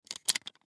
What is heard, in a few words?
Cap Gun Close, Cap Gun Close Chamber; Toy Gun Sound Effects; Toys; Foley